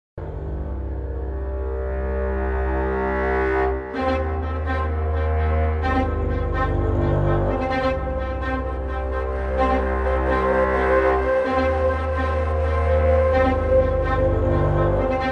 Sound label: Evolved Wood Loop Designer Straight Performance Combo; VENTO